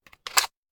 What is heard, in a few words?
Machine Gun Foley; Sound Ideas; Guns